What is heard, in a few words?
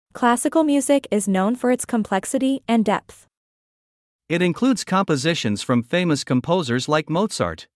Classical